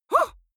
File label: female fight huh short; comedy-cartoon; soundpool